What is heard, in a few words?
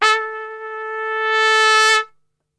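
A 2 trumpet; Best Service; Brass Super Section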